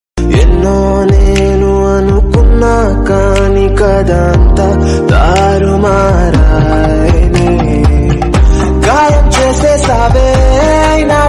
telugu love failure ringtone boy s sad love ringtone